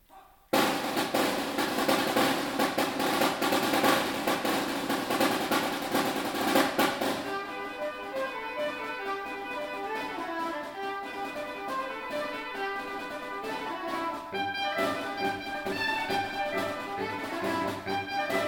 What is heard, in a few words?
Snare